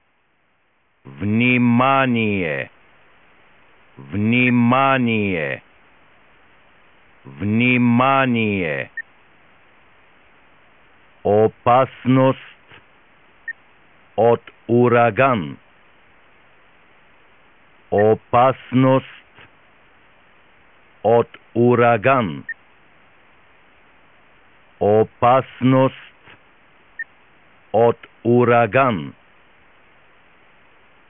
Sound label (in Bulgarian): Опастност от ураган; национални сигнали за тревоги